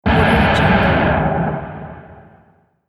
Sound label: distorted smash impact long